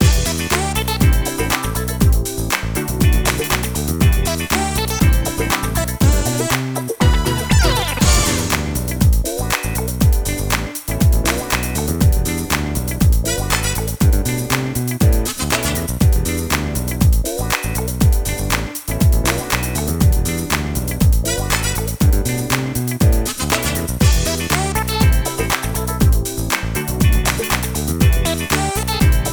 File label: Funky Disco; Supergizmos; Loons The Fight for Fame; Xbox